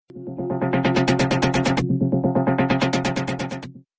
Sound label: electric beats